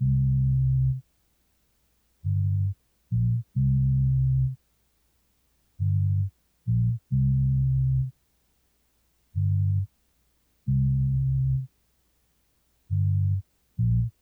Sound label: Various Melody Loop - 135 BPM C Min Bass; Loop Stems; Cymatics; 2020 Melody Collection